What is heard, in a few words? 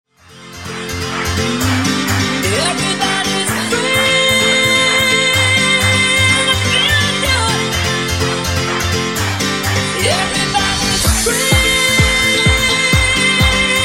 Funk Vocal 126Bpm